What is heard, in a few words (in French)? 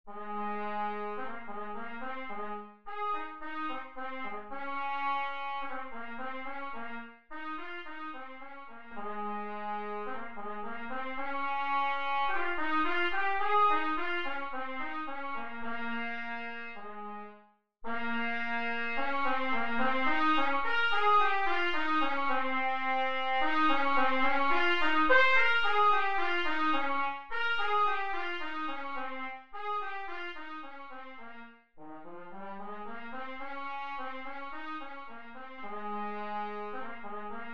sonatine; beethoven ludwig van